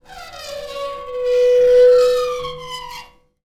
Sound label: Pane Of Glass Rub